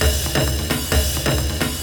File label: perc loop; Sonic Foundry; NY Dance II